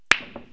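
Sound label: break, 9ball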